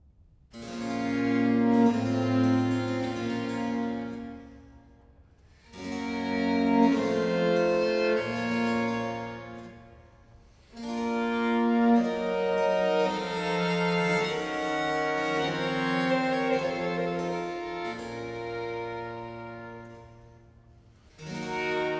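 Sonata for trumpet strings & basso continuo in D major - Adagio; Purcell; Complete Chamber Music; Brilliant Classics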